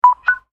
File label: Connection established sound effect